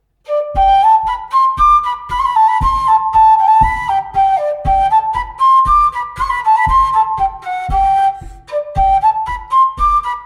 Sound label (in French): Bourree; Recueil Laussedat